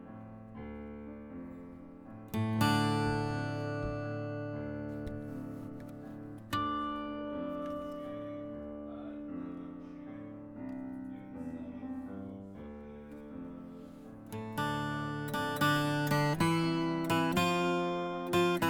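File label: guitar A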